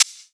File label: Open Hihat; Vengeance Samples; VEE Cymbals